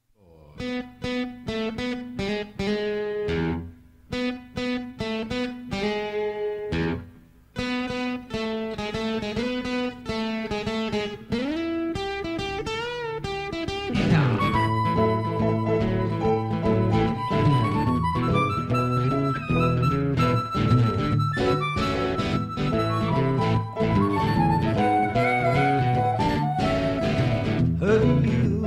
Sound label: Blues; Metro; Insurance